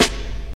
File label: Snare One Shot F# Key, snare sample tuned to the F# note. Loudest frequency: 2275Hz